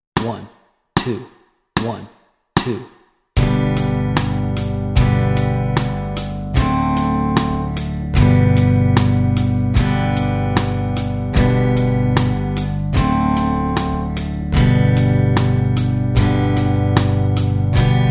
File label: Alouette Chords Track, guitar